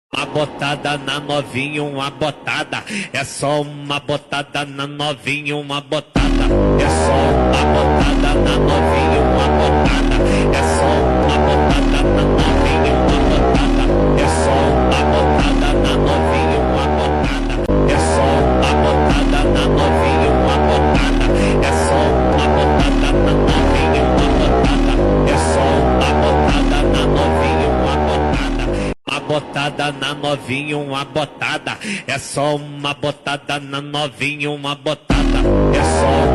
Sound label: PHONK